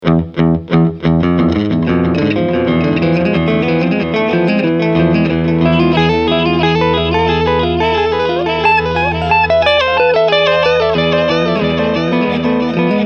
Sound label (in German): Delay pur